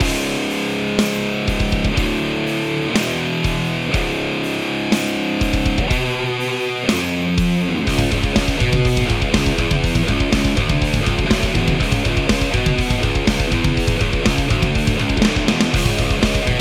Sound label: guitars doubled; doubletrack gtrs